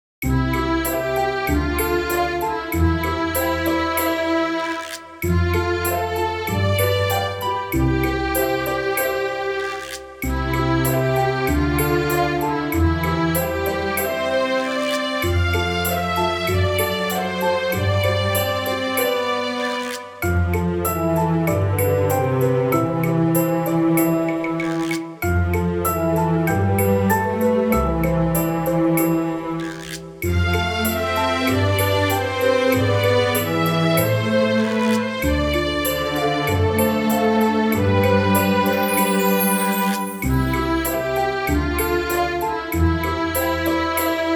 sakura street, bgm

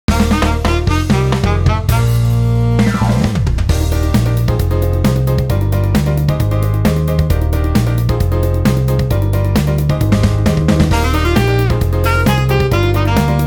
High speed boat

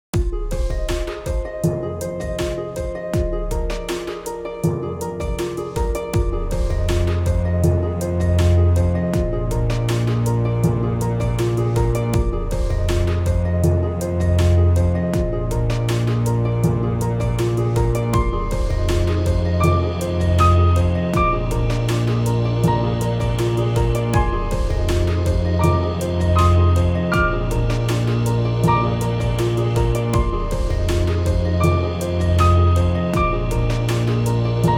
Mysterious temple

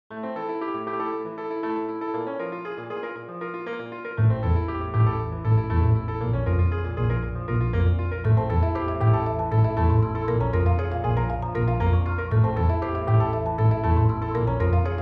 Midnight country rain road

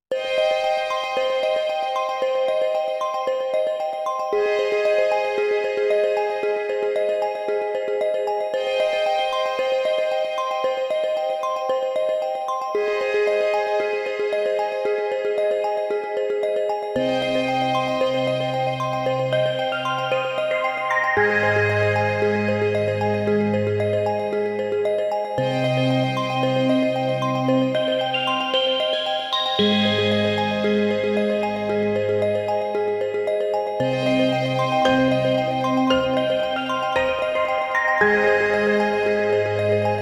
Forgotten temple, bgm

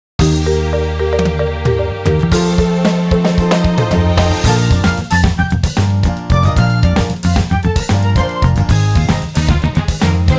first in summer, bgm

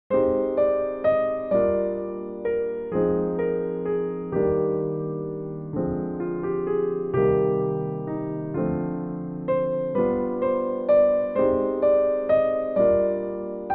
the way back after breaking up, bgm

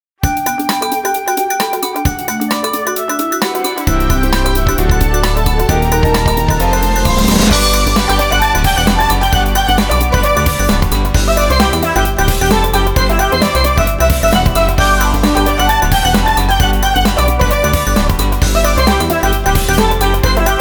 summer sea

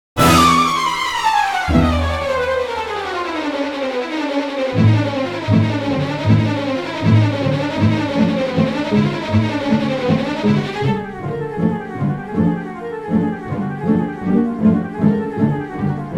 flight of the bumblebee; Classic

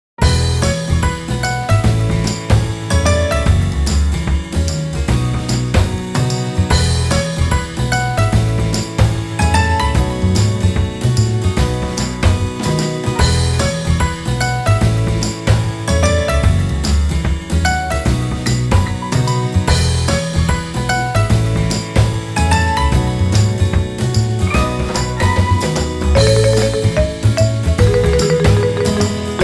Good weather for a walk, bgm

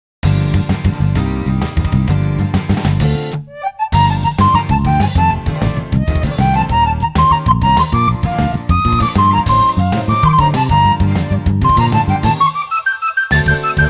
rugged mountain area

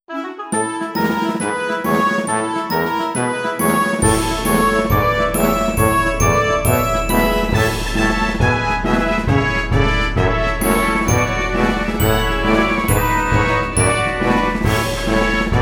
goal achievement

